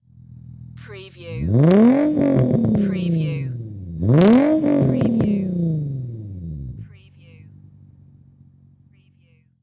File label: INFINITY G35 EXHAUST MULTIPLE REVS